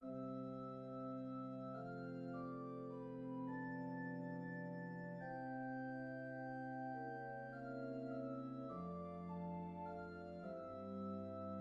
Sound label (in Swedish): Andante ur Violinkonsert